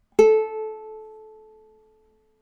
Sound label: uke